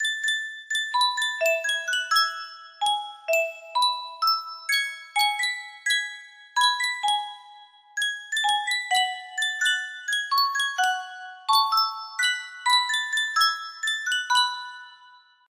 sankyo music box

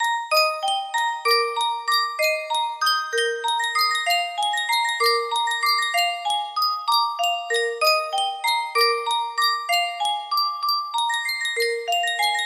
Sankyo Music Box, music box melody

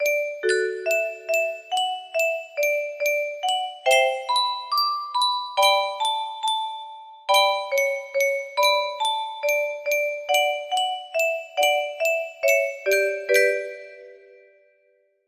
slow sad, music box melody

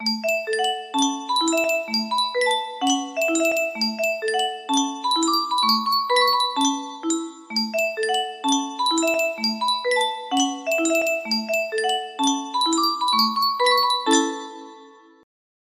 music box, music box melody

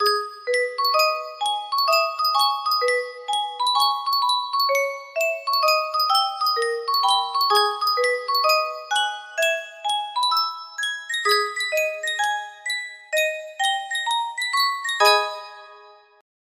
Yunsheng music box

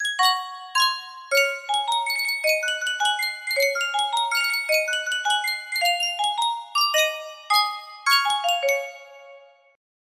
sankyo miniature music box

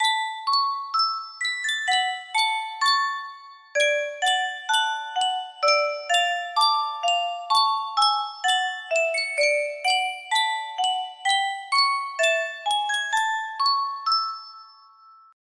Yunsheng custom tune music box; mulan

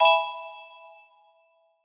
e chord, music box melody

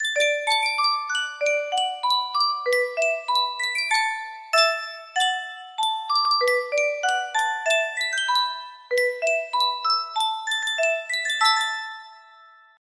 Sankyo Music Box, music box melody

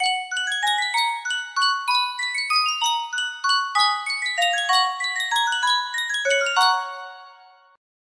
sankyo miniature music box

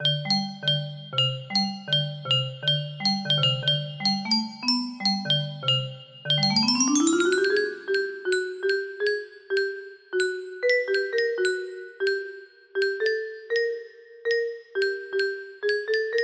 Soft Sounds music box melody

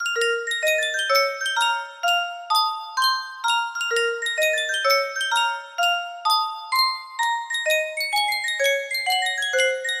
yunsheng music box, music box melody

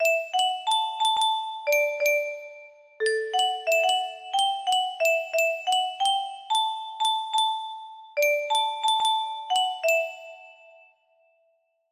melody, music box melody

